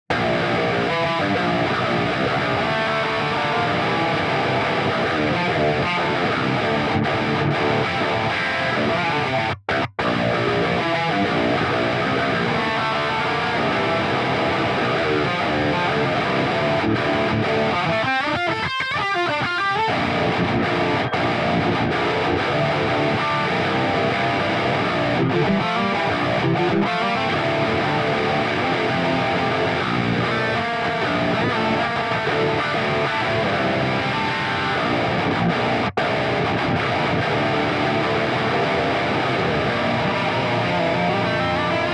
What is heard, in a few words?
Guitar Amp In